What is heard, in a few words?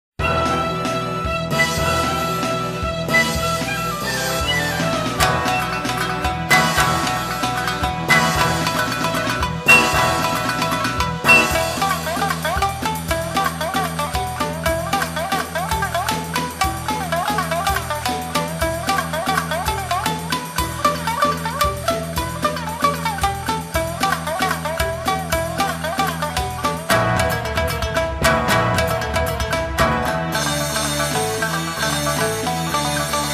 shamisen concerto